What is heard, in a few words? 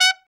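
BRA TRUMP, trumpet, brass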